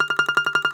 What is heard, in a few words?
DOMRA, Prima Domra, Plucked Strings; E-MU Producer Series Vol. 7 – Old World Instruments